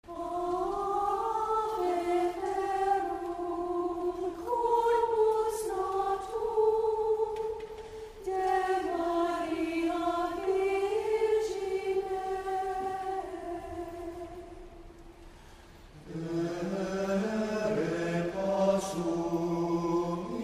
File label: Ave Verum, Chant; Good Friday; St Mark Sunday Mass